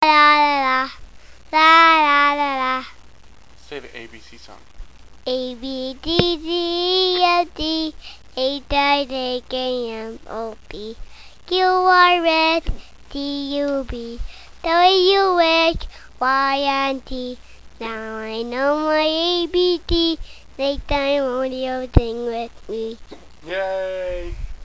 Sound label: singing